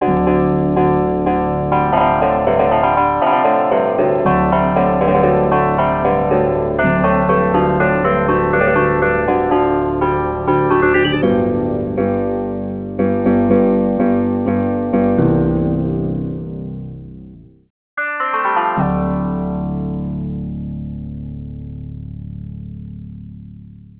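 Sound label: penta harp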